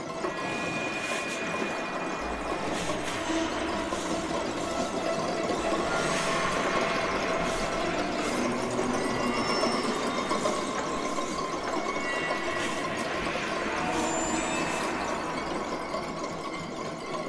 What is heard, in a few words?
Timed attack Loop; Moons of Madness